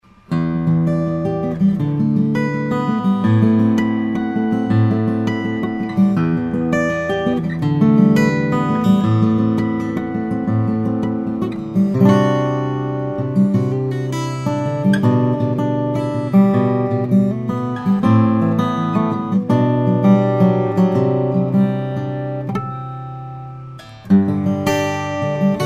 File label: nickerson fc3s, steel string guitars flattop; om cutaway soloist